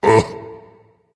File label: ugh dragon; fafnir